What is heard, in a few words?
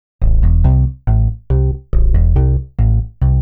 TECHNO BASS 140 A